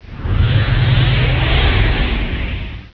MAGIC

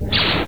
imperial blaster; Star Wars

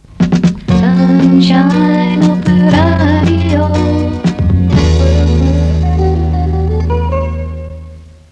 sun Shine Jingle; SunshineJingles

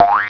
POING; Zoemtonen hisses pingen woesjes tikjes

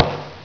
POK; Zoemtonen hisses pingen woesjes tikjes

